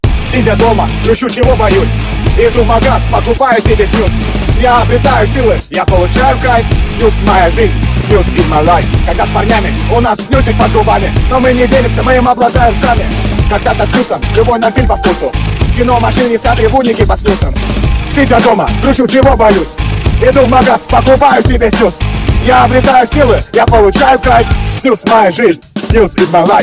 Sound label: rock cover